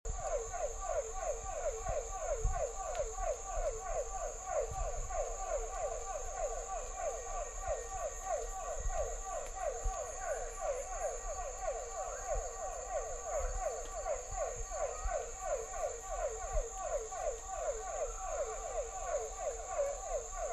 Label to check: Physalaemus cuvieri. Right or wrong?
Right